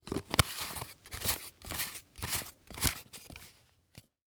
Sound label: Technology Electronic Flashlight Screw On Top